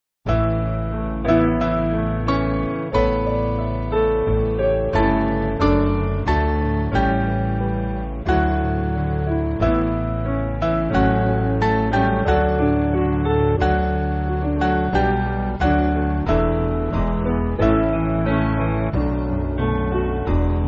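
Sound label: Piano, church backing; Mainly Piano (CM)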